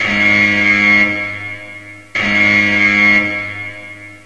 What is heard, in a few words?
nuke alarm, ambience; caged